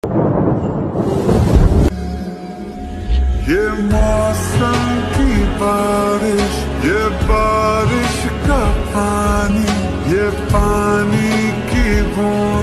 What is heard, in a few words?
slowed reverb song